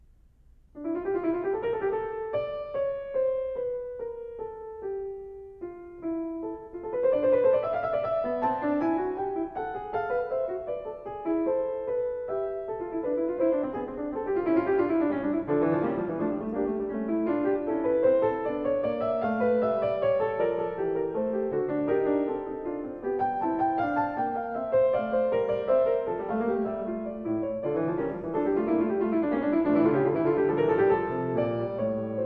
Prelude And Fugue No 6 In D Minor BWV 875 Fuga, Clavier; Johann Sebastian Bach; Well-Tempered Clavier